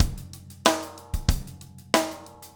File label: 16ths, drumscores